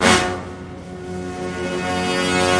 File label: horn; Horns and Brass